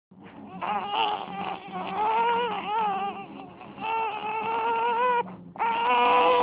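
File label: baby hungry, male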